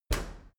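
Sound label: dry cleaner open soft, maschinen türen; tueren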